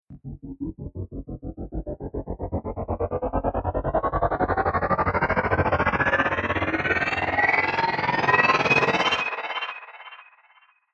Riser Glitch Robot Scifi Reverse Stinger Digital Metal; Sound Design FX; Ascending Sound Effects